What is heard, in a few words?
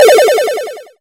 laser